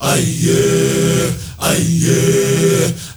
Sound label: MALE, HUMMERS; Spectrasonics; Heart of Africa 2; M.HUMMERS